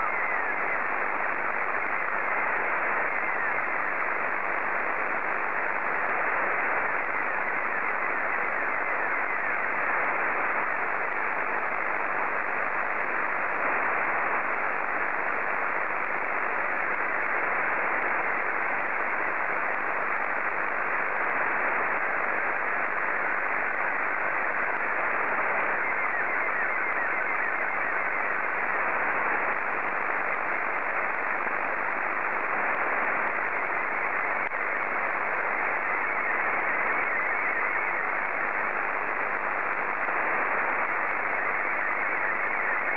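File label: SSTV, Scottie 2; JA3OEN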